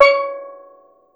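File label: STEEL DRUM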